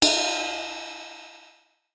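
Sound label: Ride Bell cymbal, Metallic Percussion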